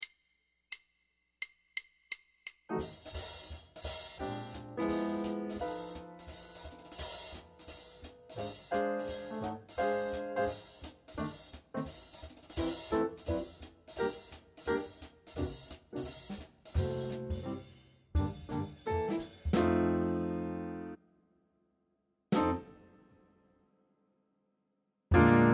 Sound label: Bass